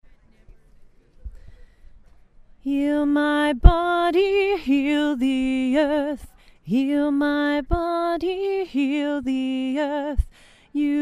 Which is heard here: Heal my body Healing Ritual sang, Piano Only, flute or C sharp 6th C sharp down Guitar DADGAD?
Heal my body Healing Ritual sang